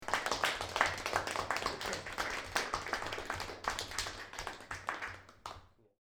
applause 15 people indoors, Applause, Small Group, Indoors; 15 People Applaud In Small Concrete Room; Human